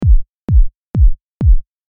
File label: bass ultra